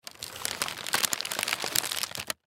Paper Bag Insert, Paper Bag Insert Object Crinkle Lunch Packaging Brown; Foley; Blastwave